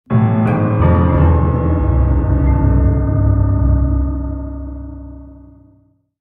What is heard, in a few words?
Jingle Dark; mata hari windows gamerip 2008